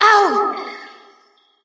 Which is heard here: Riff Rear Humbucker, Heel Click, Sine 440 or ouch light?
ouch light